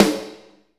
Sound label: SNR, Drumscapes Roland